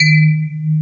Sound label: Electric Pianos; Wizoo Electric Pianos